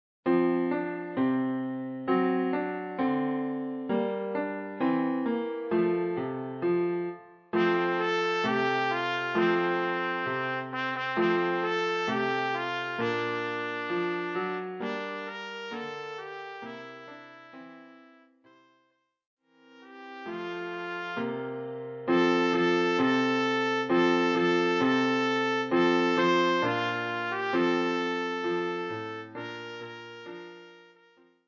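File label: trumpet; Jingle Bells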